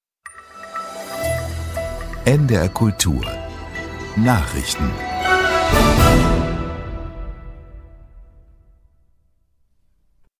News Opener

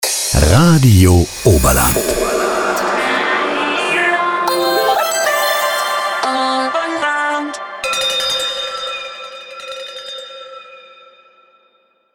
Universal Transition; RADIO OBERLAND

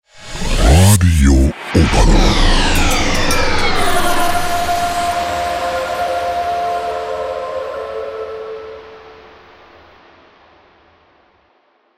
Universal Transition; RADIO OBERLAND

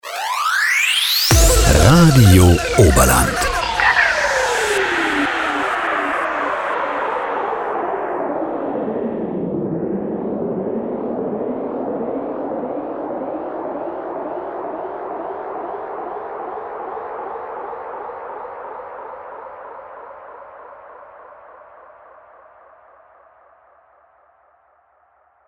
Universal Transition; RADIO OBERLAND

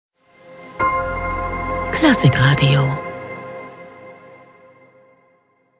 Show Opener fast Stinger

